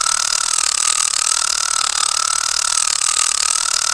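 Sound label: clockwork; Re-Volt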